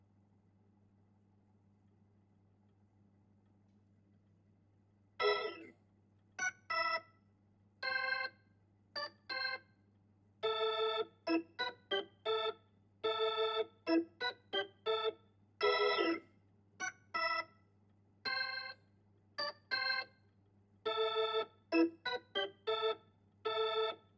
Hammond

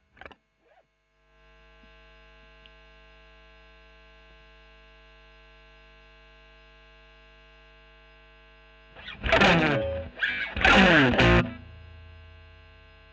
E.GTR Royer OD

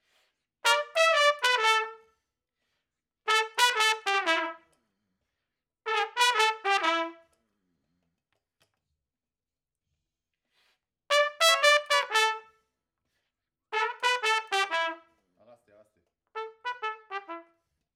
Trumpet Room

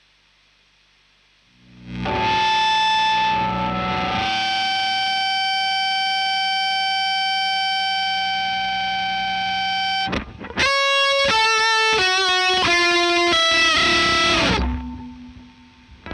Gtr Ad Libs; Marshal

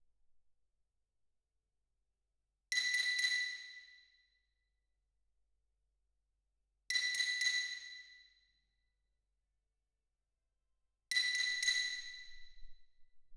Twin R Xylo

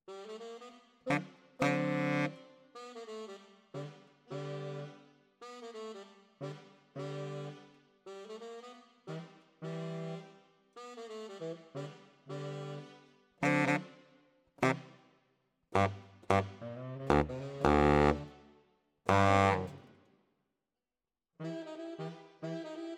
Bari, RNR for piano

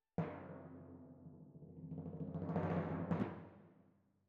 Timp Close

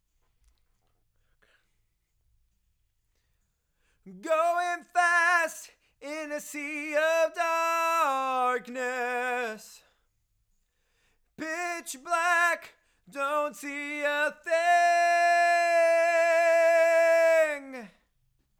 LV Choru

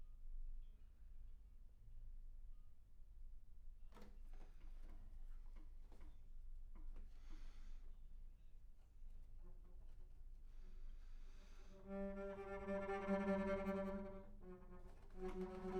Bass Room

